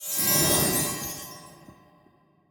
magic Path; wild bazaar

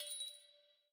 count Up End; imperialriches

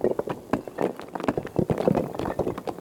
earth Particle; elements

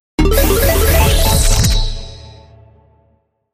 scatter Win; wild worlds

